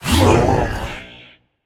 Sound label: grunt attack, voicepack; smite; jorm